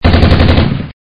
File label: Automatic Machine Gun